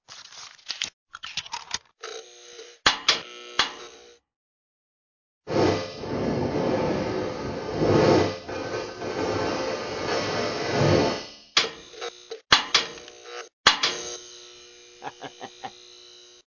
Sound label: Metal Clanking Sounds & Laugh; GTA Vice City Dialogue